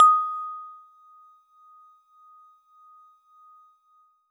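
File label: Warble Celeste DX100 D#0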